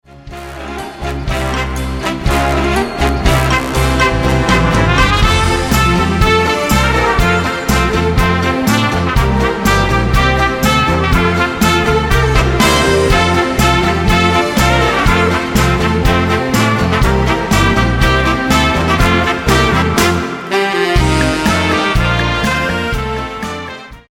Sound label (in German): AMBOSS Polka